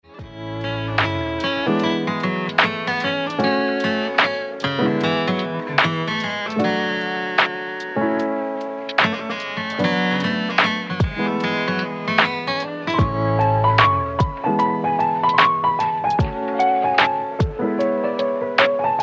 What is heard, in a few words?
canon in A major